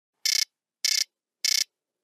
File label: frog, nokia 3310; zvuk nokia 3310